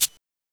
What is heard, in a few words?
Cabasa; Linn Linn-9000; Drums